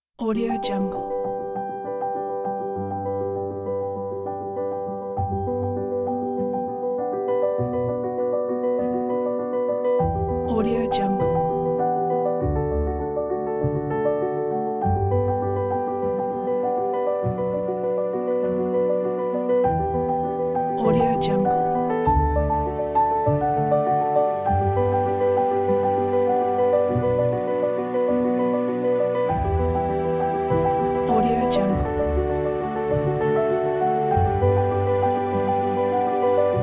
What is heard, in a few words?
inspiring piano with strings